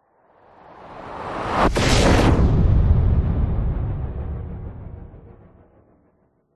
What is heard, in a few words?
sound design cinematic whoosh into a powerful explosion; zapsplat